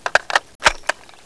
thompson clip in

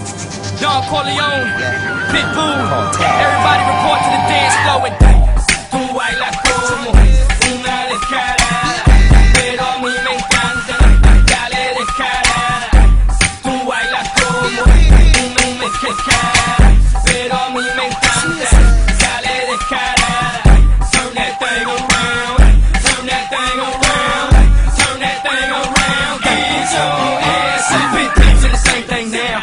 house

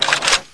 usas draw, weapons

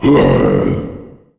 death; biohazard

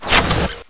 cart clip in, weapons

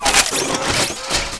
cart foley, weapons

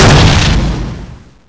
svd

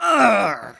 pain

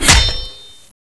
H Hit Wall

